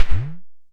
Perc - Bend Up; Tama TS-206

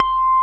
Hrm Flan C 5, GUIT2 AC; Best Service Gigapack 2

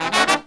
LOW HIT, Brass

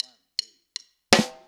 Snare, 162 BPM; drums; Drums from the Big Room The Mixes; Take Your Toms Off

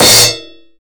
DAMPED CRASH; Greytsounds VOL-1; SDX HATS+CYM

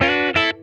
D9 SLIDE, Funk Guitar; Zero G - Funk Guitar

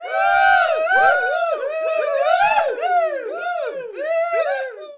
cheer; AKAI S-Series CD-ROM Sound Library VOL-6; SMLL CHEER

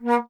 Alto Flute

